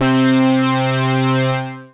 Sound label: A Date With Analog, Synth Lead; Ableton; Creative Extensions; Poli